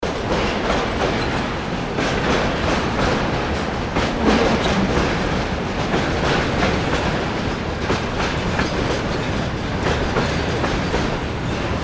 train

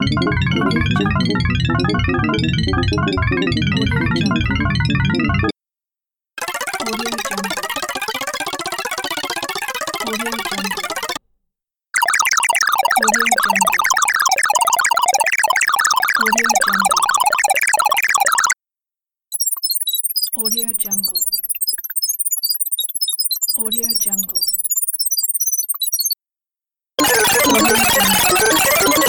data analyzed sfx pack; efxideas

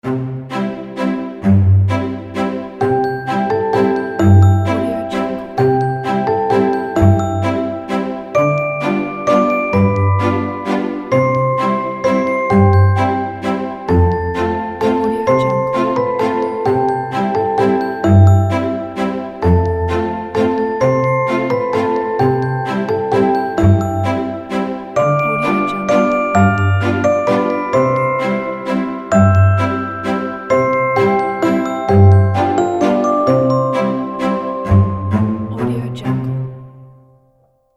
viennese silent night

